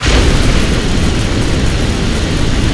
Flame Thrower Shot Loop; Weapons

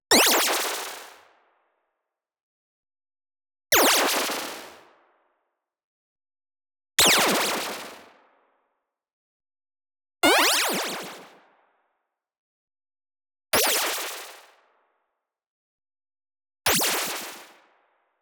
LASER Shot Delay; Anime Essentials

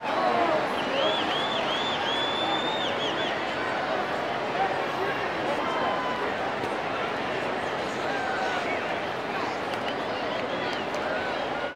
Baseball Crowd Amb Whistle; Crowds

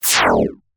Static; Blastwave

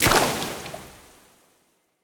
Water Enemy Footstep; AudioWizards

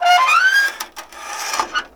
Scrape Metal On Metal; SFX Kit; Creaks, Scrapes, & Misc

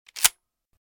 Shotgun - Reload - Open; RadwinIsland

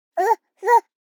BABIES TALK Boy 12 months Oh Houa; Baby Boom

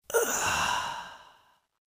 Breath, Horror Effects

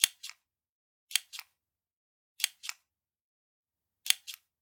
Generic Button Plastic Tiny; boom library; The Interface

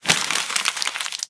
Paper Wad Up; Office Supplies; 1001 Sound Effects

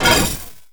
Pickup Armour Shield; Pickups

